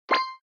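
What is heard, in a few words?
spin button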